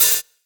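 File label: Hat Open Reverb; Drum Hits; Nu Dance Electro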